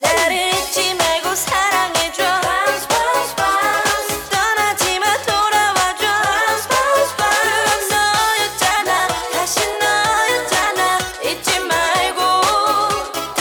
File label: Bounce